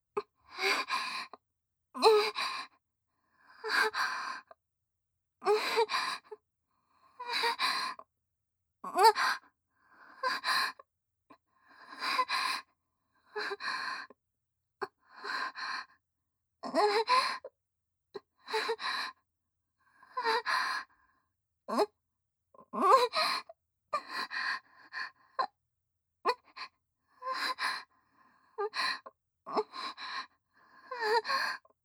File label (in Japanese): 正面近い・喘ぎ声・弱; リーシャ